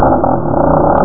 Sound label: sea